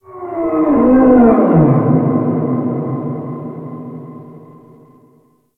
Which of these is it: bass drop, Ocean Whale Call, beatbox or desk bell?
Ocean Whale Call